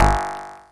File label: blips, opl3